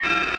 Multiple interface error tones at various pitches.; Error, Interface Pitch, Tone Web Efx, Error